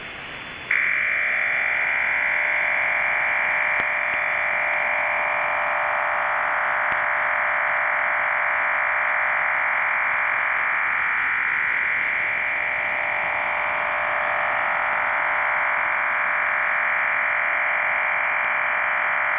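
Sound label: 27-TONE PSK WAVEFORM (PARALLEL); 27-TONE PSK "DIGISPEECH" WAVEFORM / by G3PLX (PARALLEL)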